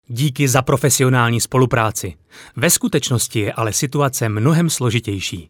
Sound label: win